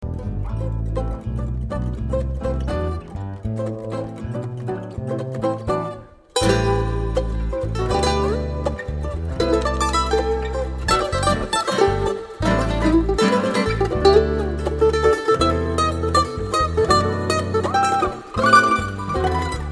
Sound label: samba